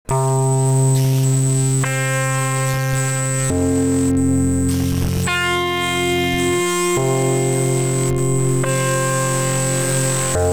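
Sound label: Guitar blown out and ringy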